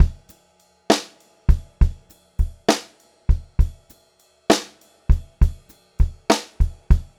Pop-Rock Drumloops, 100

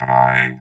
VOX VOX, Electro Vox

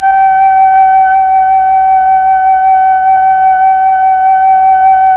FLT, Melotron Flt, Tron Flutes

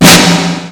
k1, Kawai K1 Drum Machines; 300 Drum Machines

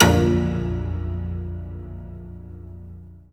A#3 HARP; Northstar; The Wizard VOL-1; BLACK HAMMER

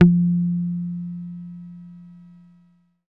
BASSES, Roland

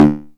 kick, Korg ER-1; 300 Drum Machines; Kit 12

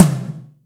TOM F1 LOU R; Zero-G; Chemical Beats

